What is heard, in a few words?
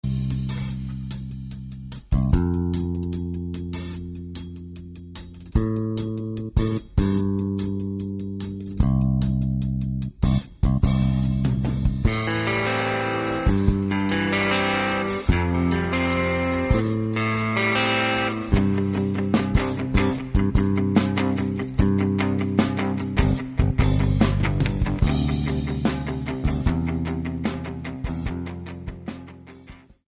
rhythm